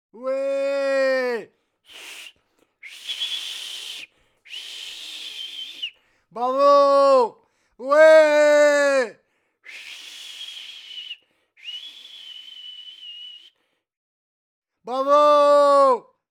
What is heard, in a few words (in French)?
PUBLIC HOMME IVRE ENCOURAGE SIFFLEMENT BRAVO